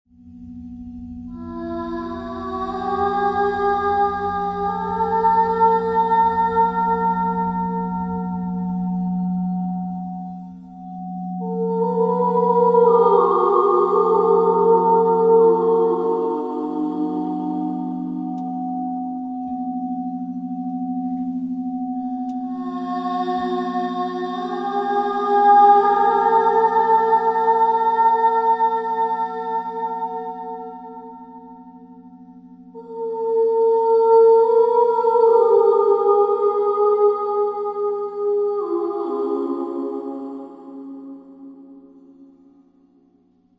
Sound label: Melody, crystal voice